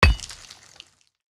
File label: axe mining stone; Factorio